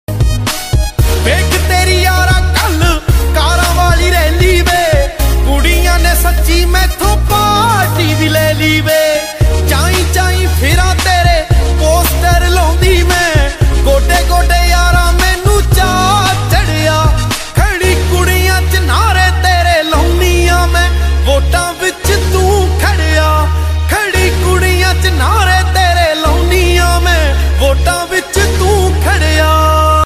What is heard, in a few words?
punjabi ringtone